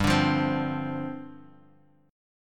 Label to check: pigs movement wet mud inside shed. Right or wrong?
Wrong